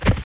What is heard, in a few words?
foot